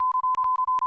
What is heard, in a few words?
transmit; matilda